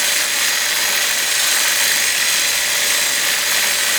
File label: Soldier Zipline Loop; Enemies; Gameplay; Warframe; Lasrian Soldiers